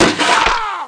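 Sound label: Metal Flash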